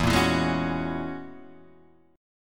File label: Gb 9th E